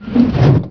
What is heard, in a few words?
Sword Statue Drop; Playable Tutorial Level; TR2